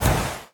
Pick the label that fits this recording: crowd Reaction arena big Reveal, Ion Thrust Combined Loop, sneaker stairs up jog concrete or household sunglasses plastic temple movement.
Ion Thrust Combined Loop